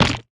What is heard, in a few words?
mech armor land ice; Factorio